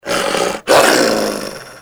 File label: bark, dog; enemy; Soldier Of Fortune II Double Helix; XBOX